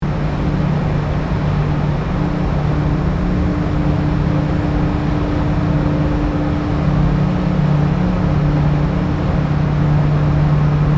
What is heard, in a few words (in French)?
Bateau